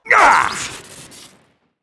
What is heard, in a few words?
karate swing crit; tf2classic